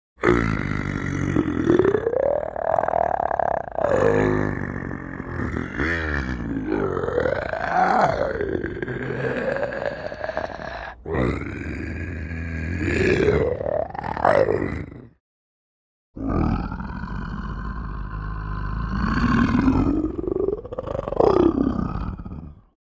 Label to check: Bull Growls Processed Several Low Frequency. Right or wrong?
Right